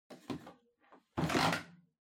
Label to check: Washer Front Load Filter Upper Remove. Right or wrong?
Right